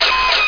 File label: goggles; TNP demo